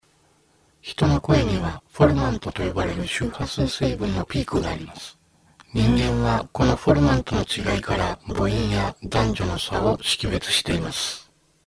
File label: formant test